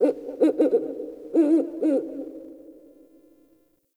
Night Tree Critters; Warframe; Eidolon Plains; Ambience

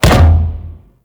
Breakout Hit One; Amalgam Carrus Pilot

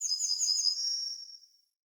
Bird Song; Galene Bird

